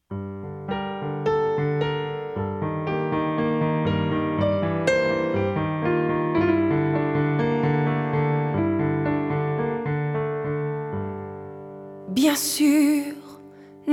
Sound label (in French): DEMO CHANT